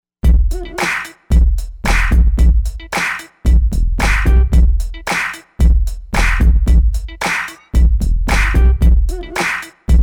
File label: BOUNCE, AKAI XR20 Factory patterns